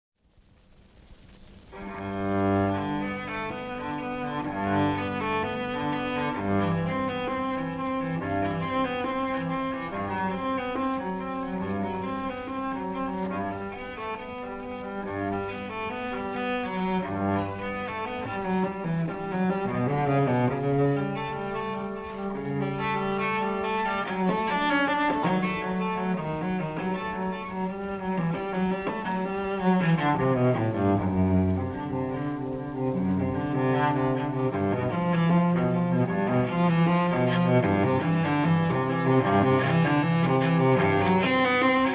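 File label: Cello Suite No 1 in G Major Prelude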